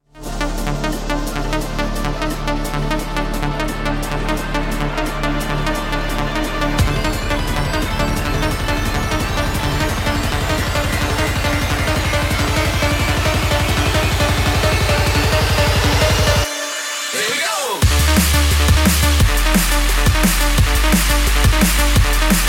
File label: drum sound bassline